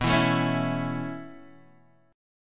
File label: A Major B